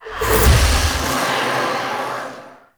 Swarm Octopede Activation; Warframe; Entrati

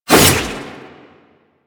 Sickle Whoosh; Lasrian Butcher Sickle; Lasrian Soldiers; Weapons

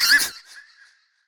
Pain F; Dialog; Warframe; Zariman; Skitter Girl

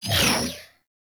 Laser Start; minigame; boss; Warframe; Caliber Chicks

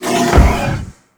Small Door Close; Orokin

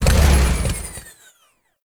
Motorcycle, Boost Stop; Warframe; Vehicles; Motorcycle Ninety Nine

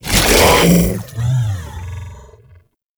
Devour Impact; Warframe; Grendel; Grendel Deluxe

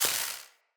Casting Device Projectile Destroy; Weapons; Player

